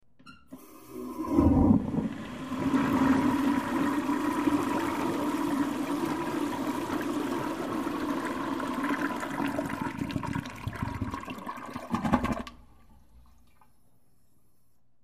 Slow Flush Toilet, HOUSEHOLD TOILET: INT: Older low tank toilet